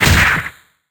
Punch short

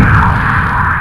Smiler Jumpscare; backrooms 2d android ios online windows gamerip

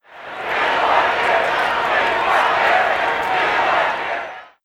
PROTESTS

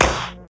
perc

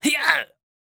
VO Death; VIP

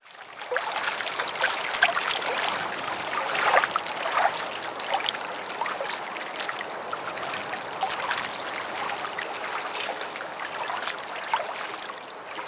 environment water quiet docks

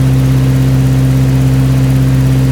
Subaru Impreza Low; car simulator 2

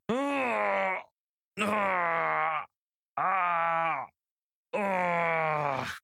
moan; Devour

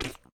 Meat Impact; A Robot Named Fight

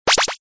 Box

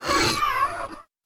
Monster Cry; a robot named fight 2017 gamerip

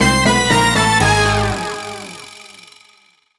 Event Gameover; Disney Stitch Jam; NDS

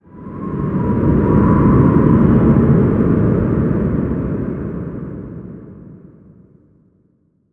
environment forest creature howling; Aragami

